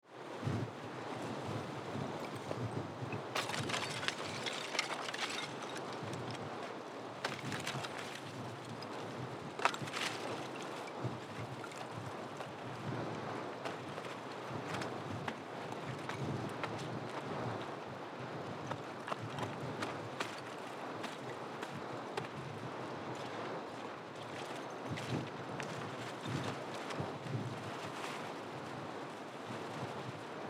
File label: knocking rime off sign